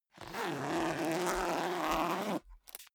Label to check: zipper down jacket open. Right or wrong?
Right